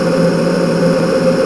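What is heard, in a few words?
fast med loop, ships engine; Quantum Redshift; XBOX; tara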